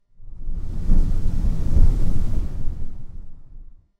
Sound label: strong wind gust